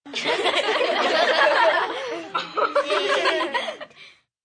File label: Group Chuckle, Laugh, Group, 8-10 girls, quiet giggle, females, kids; Laughter; human voice; Sound Effects:Voice; Voice Box